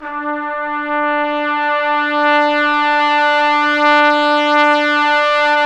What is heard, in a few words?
BRS TP SWE, Orch Ensembl; Roland L-CDX-03 Disk 2